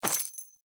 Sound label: Crate Coin Drop; Objects; Pickups; Neathuns